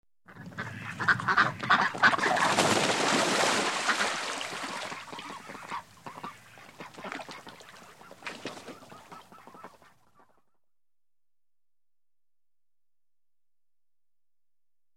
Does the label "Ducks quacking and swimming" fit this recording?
Yes